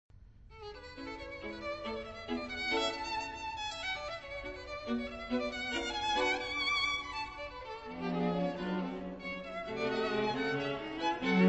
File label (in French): Quatuor la